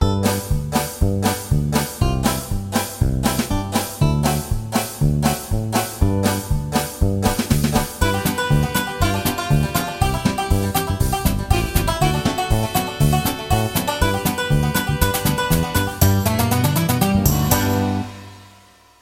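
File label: Bluegrass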